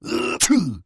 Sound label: character lion fishing; Fishing For Gold